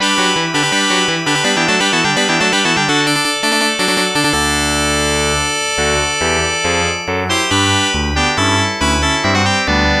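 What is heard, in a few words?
background music; Legendary Wings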